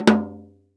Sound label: changgo, korean per; AKAI S-Series CD-ROM Sound Library VOL-5